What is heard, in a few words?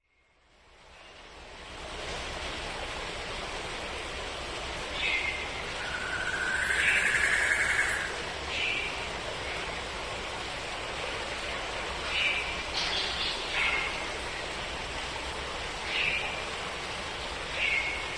Jungle Sounds